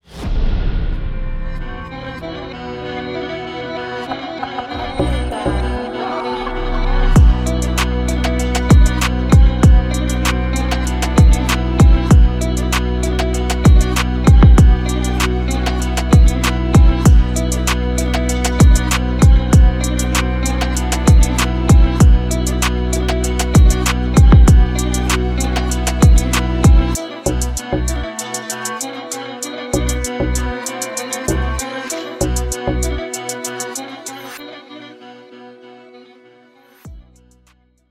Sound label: BEAT